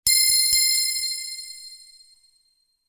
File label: Bells